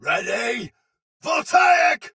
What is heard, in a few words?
attack soldier sword agent male; Dialog; Barks; Battle; Melee; Ode Tech; Sinecure